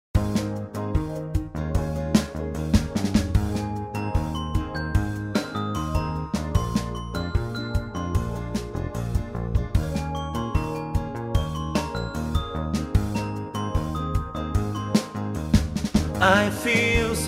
POP

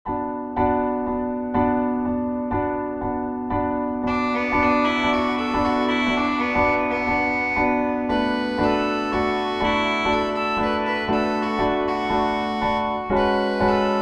Hungarian folk melody; Mikrokosmos; Bartok

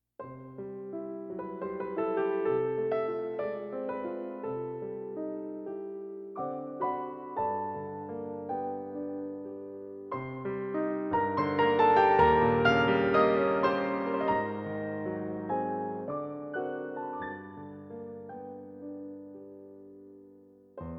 Grieg, Yamaha, piano; Pianoteq beta test

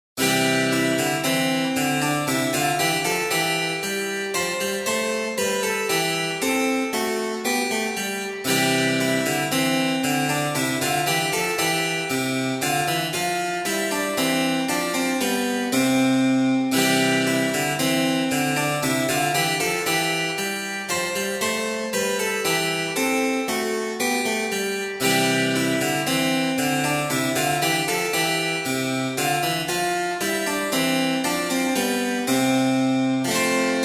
Harpsichord Ruckers II

